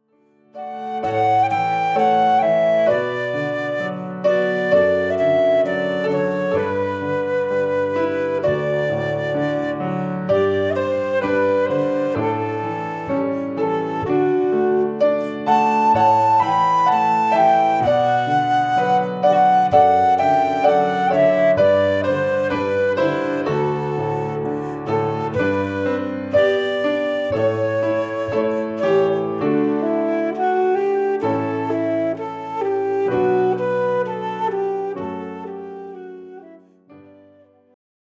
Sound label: piano flute canon